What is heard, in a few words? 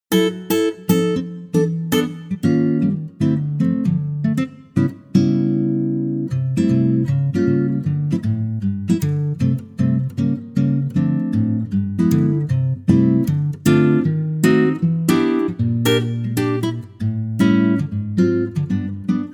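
C acoustic guitar; key - C - vocal range - B to E; Superb acoustic guitar arrangement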